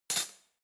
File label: cutlery spoon drawer place hard